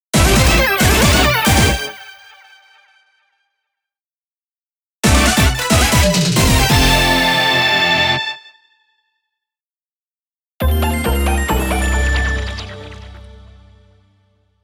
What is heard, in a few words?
Mission Start Success Failure; bullet girls 1 2 soundtrack